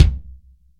subtle reverb kick single hit b key

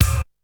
large room bass drum a sharp key; kick drum samples

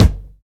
acoustic kick g key

